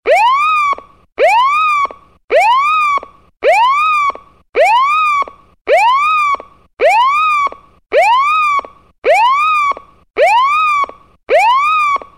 Cop Siren

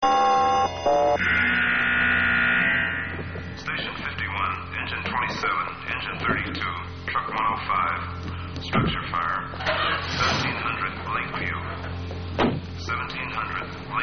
Tones; Station 51 Sf

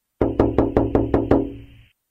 Knock Knock

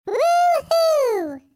Woo Whoo

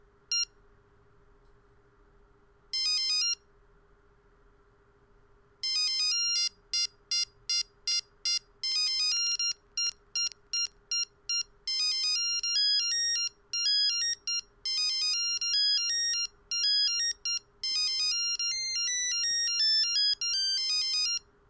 Groovy Blue

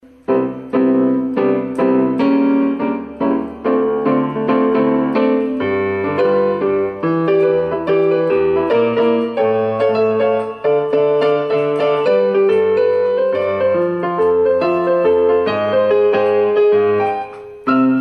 Piano improvisation

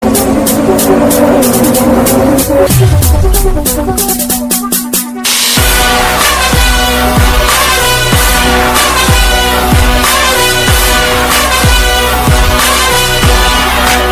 Sketch bg music

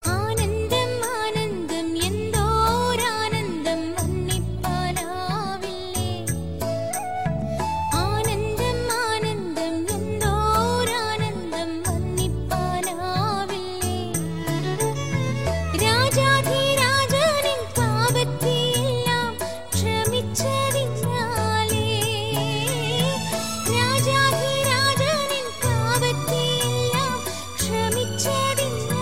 Malayalam; Aanantham

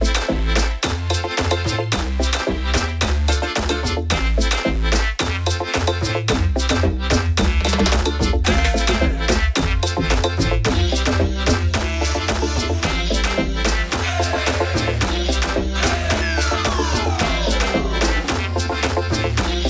Reggaeton Hehe Vox

